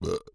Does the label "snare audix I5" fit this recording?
No